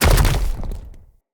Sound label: Root Spider Mother Land Dirt